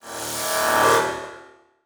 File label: Transition Sweep Warble; Production Element